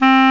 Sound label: Clarinet; GMPlay